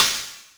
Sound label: CYMBAL, Yamaha RM 50